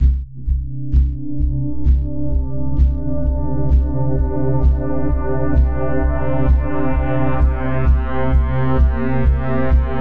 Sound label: Downcast Pulse Cmin 130 bpm; MIDI Clips; Tonal; Atmospheric; Ableton; Factory Packs; Mood Reel